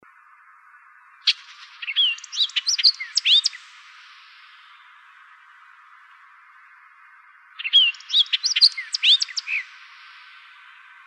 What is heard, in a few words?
HOOR, Icterids; AZFO; deviche